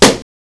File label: shot